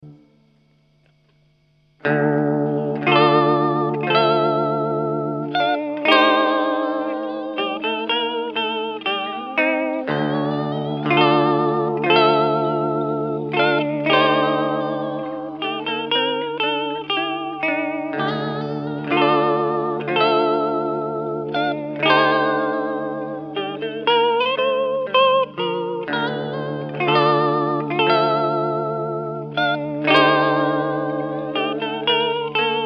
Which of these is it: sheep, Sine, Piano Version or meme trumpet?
Sine